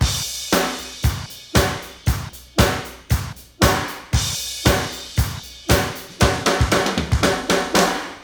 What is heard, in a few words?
Bridge Drum Guide; Multitrack; Remix Parts